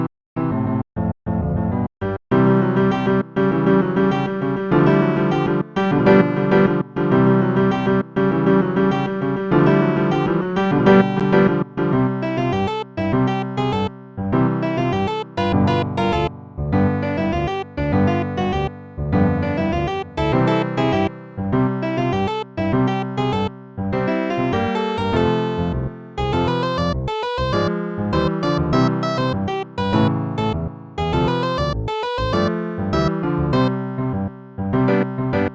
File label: Overworld 8-bit Soundfont; NSMB; Super Mario Kart Soundfont; JDStudios video game covers 2023-2024